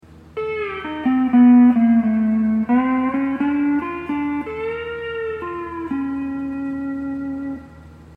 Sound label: hawaiian piece